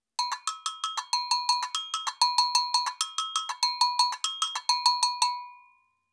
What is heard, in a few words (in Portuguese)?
Agogo samba lente